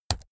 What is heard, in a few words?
keyboard laptop key press fast hard